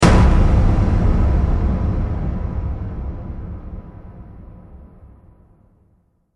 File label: Explosion Impact Dramatic, bomb